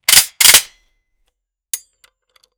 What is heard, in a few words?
gun